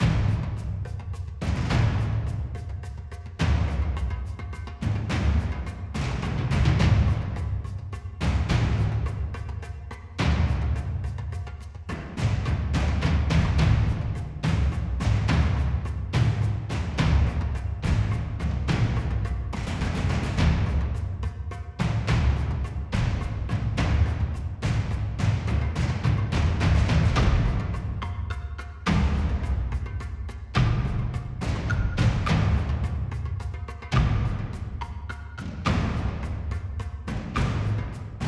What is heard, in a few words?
Battle Calm; Toussaint; Dun Tynne